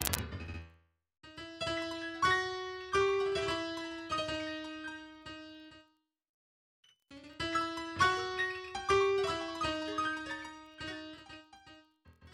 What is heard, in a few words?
Black Swan Violin